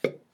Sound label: Pop